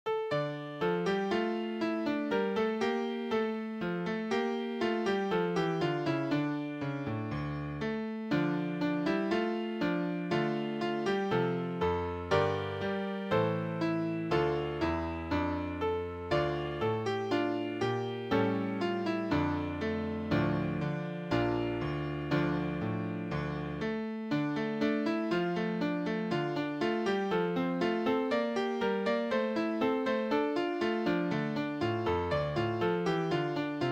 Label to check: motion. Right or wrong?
Wrong